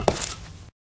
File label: black tile, footsteps